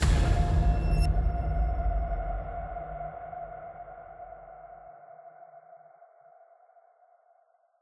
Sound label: Fight Fight Start